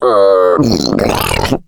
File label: female boomer lurk